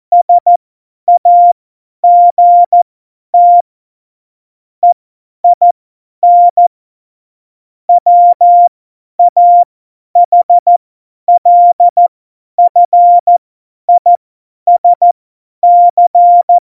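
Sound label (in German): Witz, cw morsen; Morsekurs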